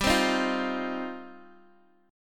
G 7th Suspended 4th Sharp 5th G down Guitar DADGAD